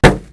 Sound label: car; Mafie 1